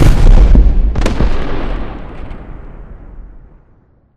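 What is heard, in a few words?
explosion distant kaboom; corporate; Titanfall 2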